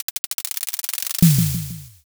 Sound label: drumroll